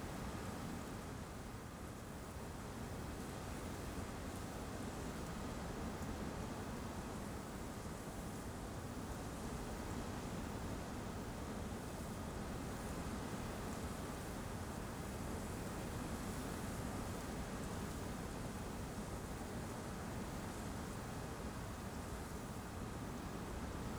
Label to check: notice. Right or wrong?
Wrong